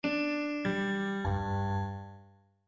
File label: dulcimer; AJ Hipkins